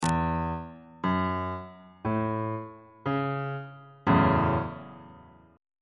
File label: g flat minor 7th 3rd inversion on bass clef